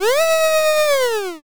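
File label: scream male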